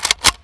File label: saiga bolt cock, weapons